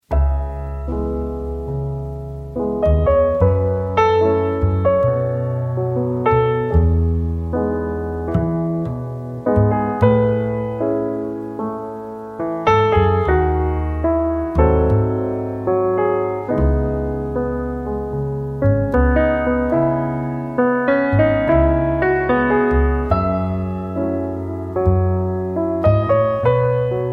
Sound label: Cry piano bar